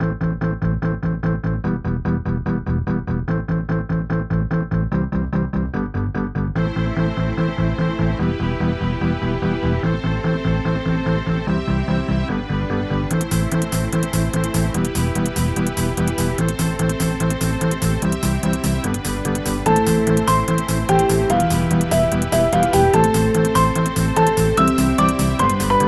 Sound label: mountain boss, music; action RPG game